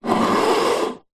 wooden box small empty slide upside down smooth concrete screed; foley